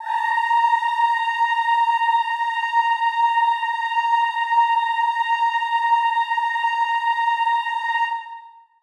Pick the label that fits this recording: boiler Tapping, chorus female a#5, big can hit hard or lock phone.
chorus female a#5